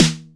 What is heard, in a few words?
snare classic; snares